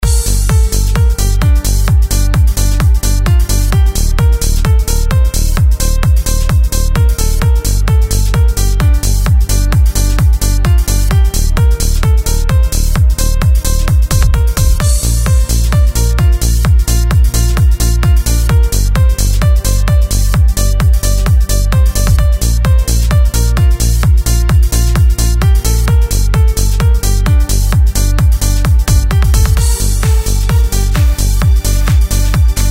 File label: A walk in the sky (130 BPM)